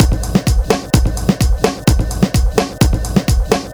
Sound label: Housebeats, 128BPM; Vengeance Samples; VEH2 Loops